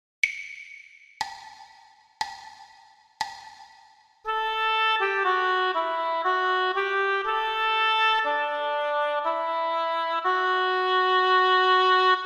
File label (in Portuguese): percepcao ditado